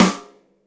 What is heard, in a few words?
snare ff, drum kit; unpitched percussion